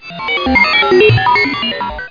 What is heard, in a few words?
Sound Space Computer; The Amazing Spectacular Ordinary Bottle